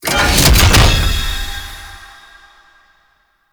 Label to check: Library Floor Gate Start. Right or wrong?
Right